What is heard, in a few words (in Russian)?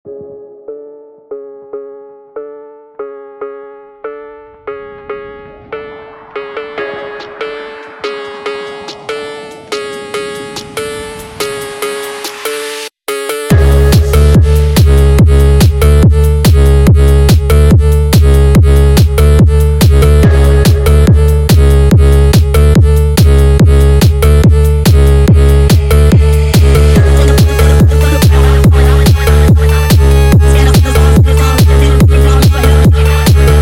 Бразильский фонк для зала и тренировки sped Up